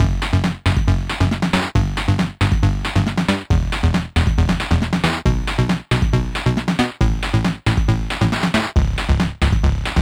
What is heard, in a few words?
Freddie Friday Loop, Short VGM Loop for friday fun